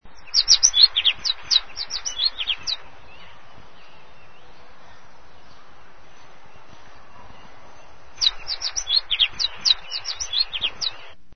Pajaros BIRD

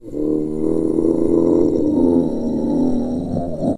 zombie back

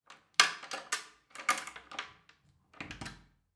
Door Lock Key

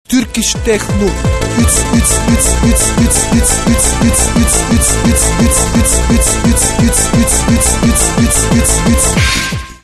techno

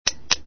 click

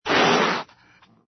ANIMAL CAT

